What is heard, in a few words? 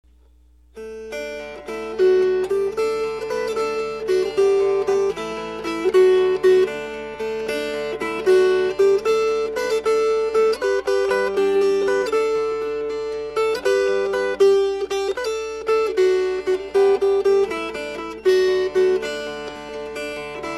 Fingerpicked